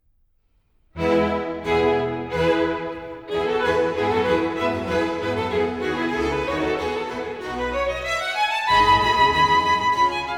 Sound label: Violin Concerto No 2, Allegro; Bach; Classical Push by Pentatone